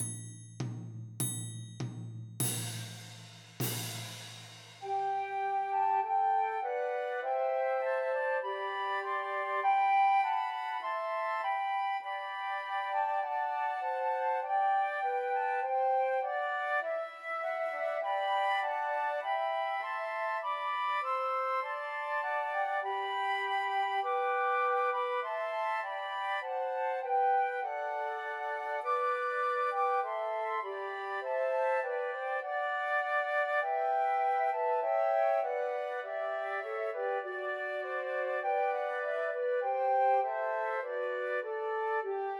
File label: Trios for Three Flutes; See Amid the Winters Snow; Christmas